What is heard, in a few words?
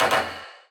wadaiko